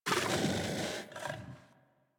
Kubrow Armored Vocal Breath Walk; Warframe

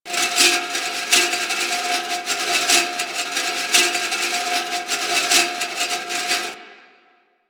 Broken Fan Loop; Props; DE; Warframe

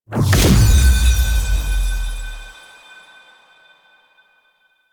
Platform Activate; Warframe; Cephalon Weave

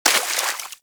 Bullet Impact Debris Water

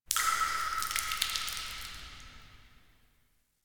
Interior Cave Drips; Ambience; Duviri; Warframe

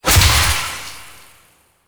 Projectile Death Medium; Weapons; Warframe; Duviri; Incarnon Adapters

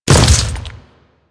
Step Flesh, Footsteps; Warframe; Grineer

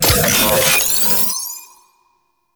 Password Glyph Pickup; Warframe; Veilbreaker; Kahl

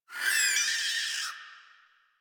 forest rodent vocal death; Characters; Warframe

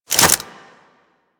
Equip, Weapons; Warframe; Furax

